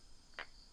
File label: polypedate leucomystax, frogs